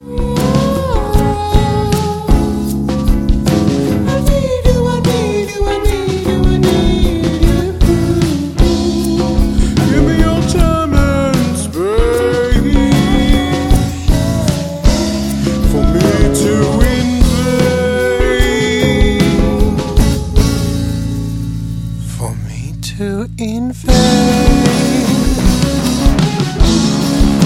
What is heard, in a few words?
Electric Harmony